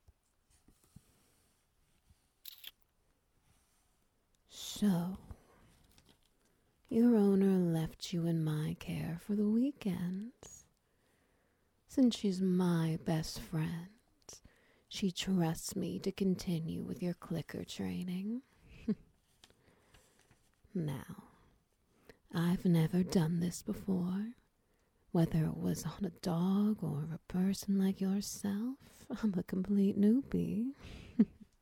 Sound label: Ambience, Wet Noises